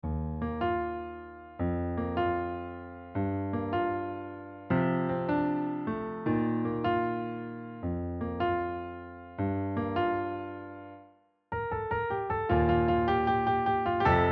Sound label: solo, piano solo; Luka Chuppi